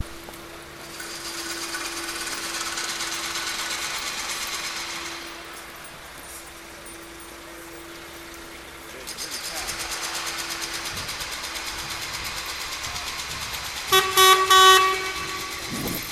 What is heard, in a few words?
construction noise; outside the tate modern